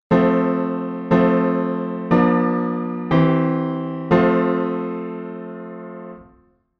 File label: authentic I IV V, 16 edo; 16 edo theory